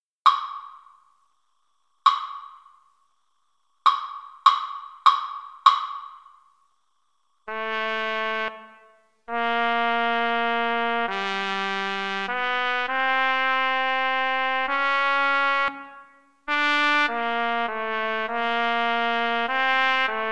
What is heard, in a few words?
trumpet duet